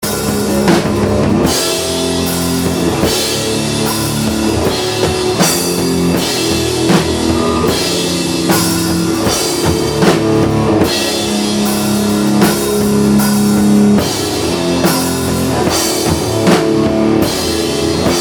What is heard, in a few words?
Bouzouk